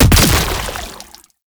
Shotgun Explosion; Warframe; Tenno; Alchemist

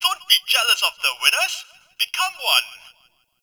Gambling Machine Bark; Warframe; Ambience; NineteenNinetyNine

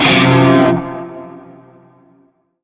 Shawzin Hirajoshi Chord G; Music; Warframe; Narmer

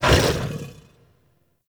Axe Weapon Idle; Warframe; Weapons; Tenno; Wolf Frame

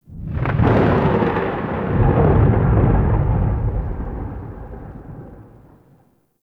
Thunder; Warframe; The New War; Narmer Outpost

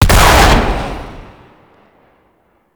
Pump Shotgun Alt Shot C; Weapons; Warframe; Zariman

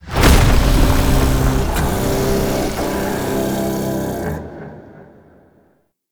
Mirror Defense Rune Count Threshold; Warframe; Entrati

